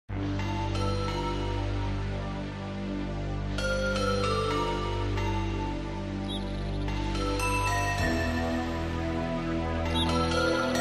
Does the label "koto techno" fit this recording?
Yes